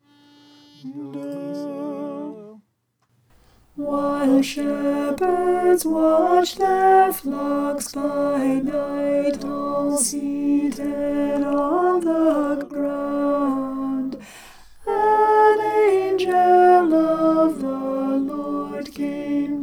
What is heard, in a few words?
alto